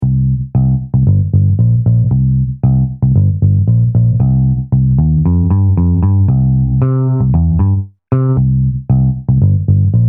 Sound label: Bass Edgy